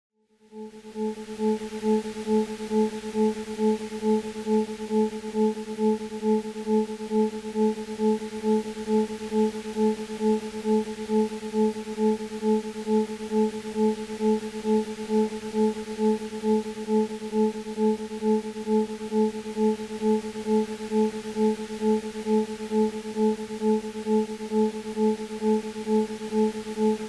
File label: delta harmonic